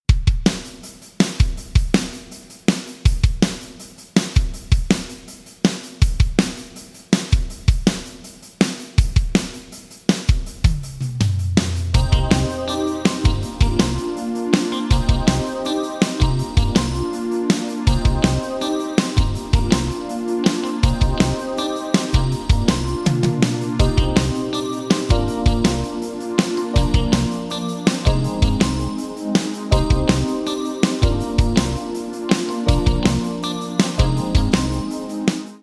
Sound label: Drums Roland SRV 3030 Gold Plate; Vintage Digital